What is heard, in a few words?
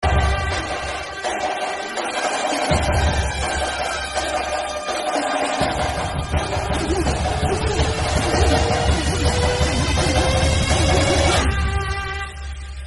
emotional; raji